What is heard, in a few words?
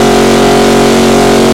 Aston Martin Vantage High